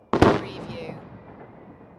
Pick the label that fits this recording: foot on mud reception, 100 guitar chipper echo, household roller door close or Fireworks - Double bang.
Fireworks - Double bang